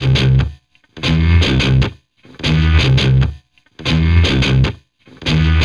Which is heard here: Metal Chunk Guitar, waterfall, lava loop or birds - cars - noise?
Metal Chunk Guitar